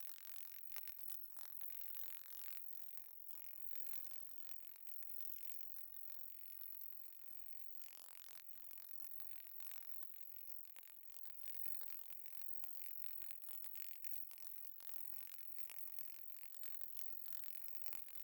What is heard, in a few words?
Vhs Tracking Glitch Texture